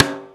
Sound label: Snare; StecMetronome